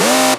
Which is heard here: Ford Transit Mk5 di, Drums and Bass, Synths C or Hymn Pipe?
Synths C